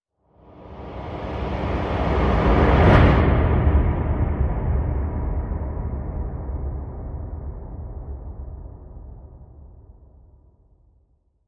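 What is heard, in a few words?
Whoosh Tidal Wave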